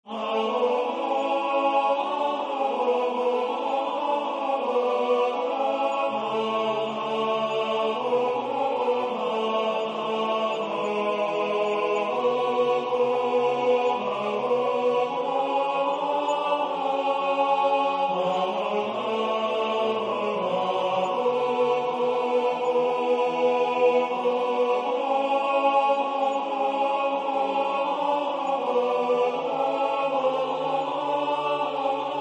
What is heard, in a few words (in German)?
tenor; Lyrisches Intermezzo